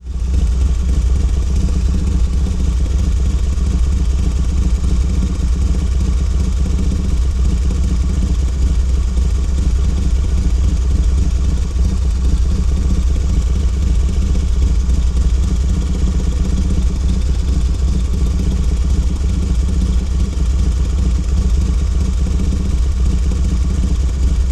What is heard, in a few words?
motorcycle idle; transportation